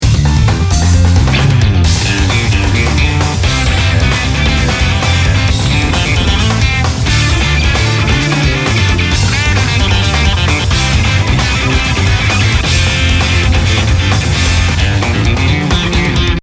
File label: guitar lead